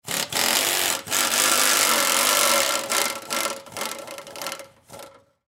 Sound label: Air Wrench Loosen, Air Wrench Undo Flywheel Pneumatic Loosen; Power Tools; INDUSTRY; Air Wrench Undo Flywheel Long Pneumatic Loosen; Blastwave